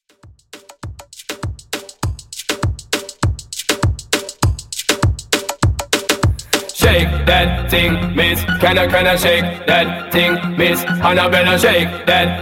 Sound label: Afro Moombah 100Bpm